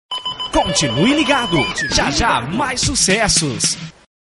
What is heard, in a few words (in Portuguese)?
vinheta